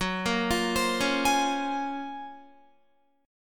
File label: F sharp Major Sharp 11th F sharp, Guitar; F#M#11 arpeggiated; 4F,4A,5C,5G,5C,6E; chord shape